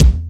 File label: raw kick drum sample a sharp key; kick drum samples